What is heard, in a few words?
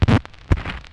Stylus Noise, Vinyl Noise; noise hiss crackle